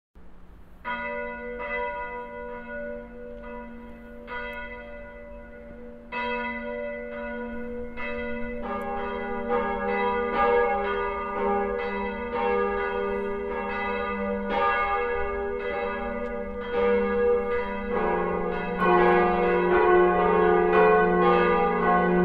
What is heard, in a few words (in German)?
Glocken; Sonntage im Jahreskreis; St. Lorenz; 12-Glocken-4-3-2-Gloria